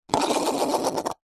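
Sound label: sport golf ball knock into hole cup spin to stop; zapsplat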